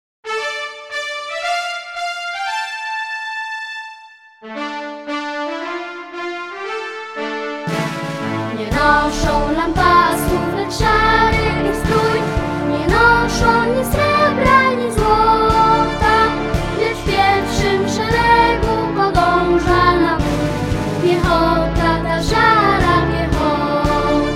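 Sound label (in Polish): wersja wokalno instrumentalna; Szara piechota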